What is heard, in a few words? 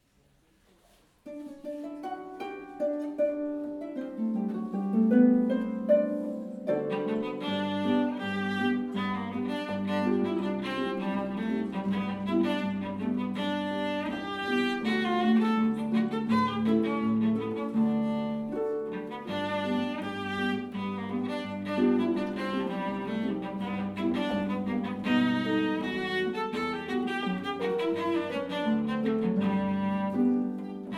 ragtime